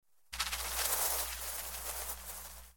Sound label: butterflies flyaway